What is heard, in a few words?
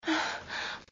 Pant